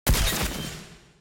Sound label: action attack; akshan